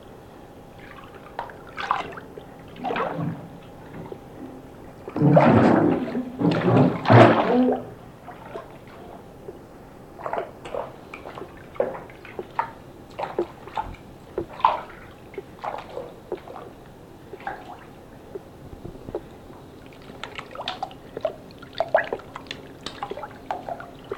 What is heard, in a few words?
bathtub